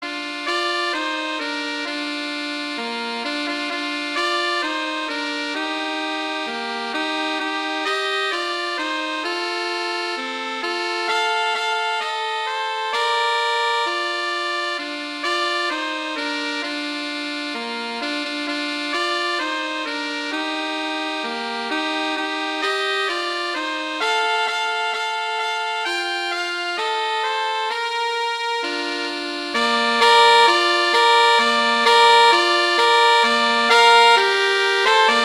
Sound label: Jingle Bells, Christmas